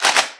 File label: switch; cdogs